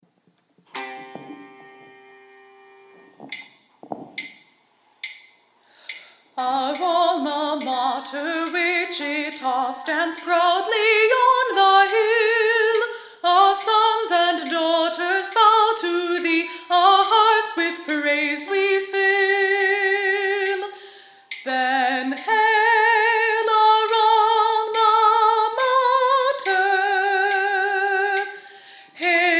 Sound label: Click Soprano; Alma Mater; virtual choir